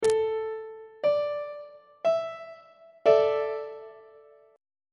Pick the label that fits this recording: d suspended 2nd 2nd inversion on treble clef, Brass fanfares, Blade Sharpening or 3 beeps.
d suspended 2nd 2nd inversion on treble clef